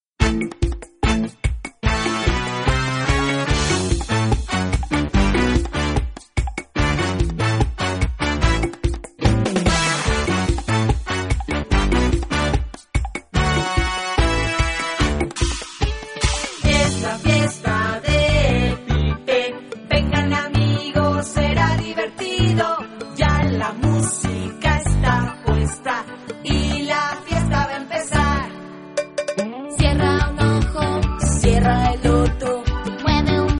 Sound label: pipe